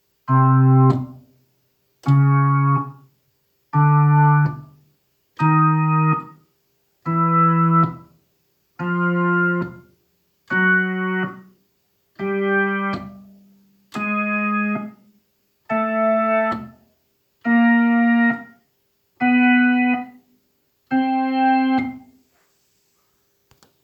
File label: hammond